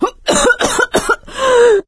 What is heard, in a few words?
cough, survivor voice; source engine; left 4 dead 2; producer